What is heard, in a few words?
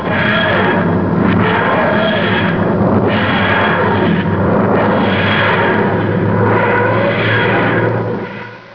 tardis; drwho